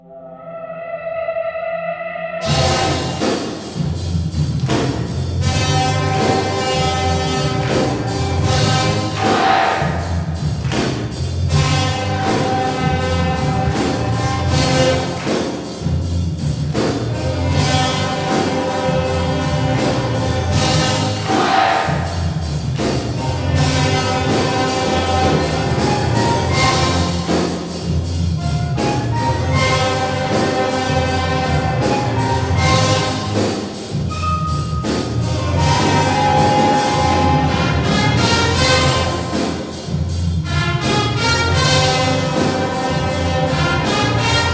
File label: Fight Tune; ncaa basketball 10; ps3; xbox 360